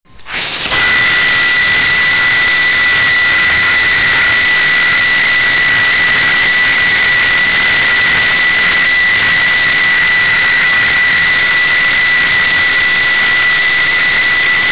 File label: metallic dice; dnd